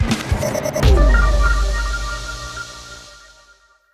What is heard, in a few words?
FILL, PsyTrance